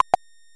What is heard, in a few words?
Lever; Treasure Galaxy (1994); Learning Company